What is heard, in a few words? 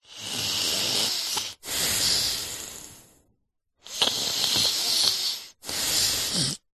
human male alsleep breathing interuption breathing heavy then sudden stop